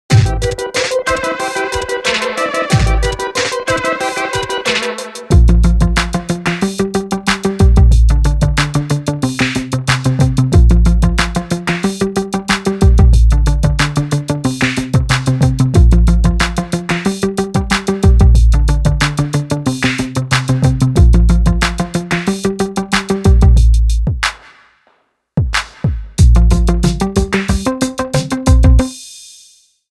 (INSTRUMENTAL)

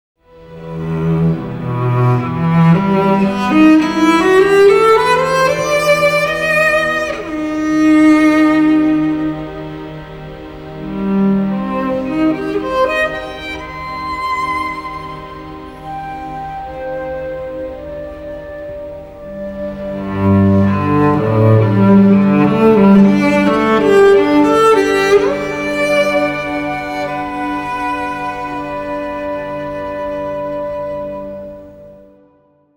FIRST MOVEMENT

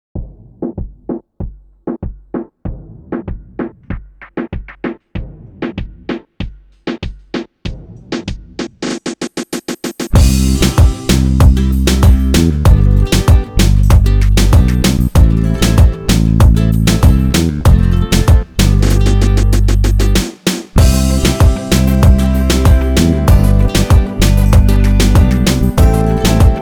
REGGAETON GIRL INSTRUMENTAL

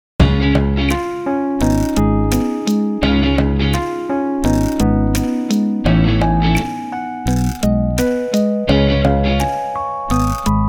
CURIOUS TRUMPETS

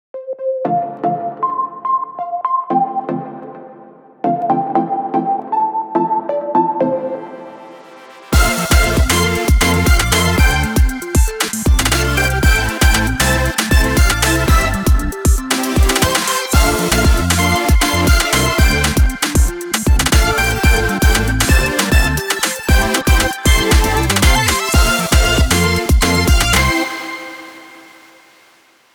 Energetic Summer Pop

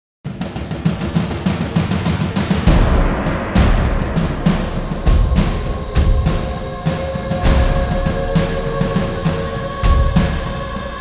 TRIBAL

